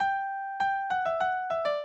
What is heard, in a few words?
minuet, mozart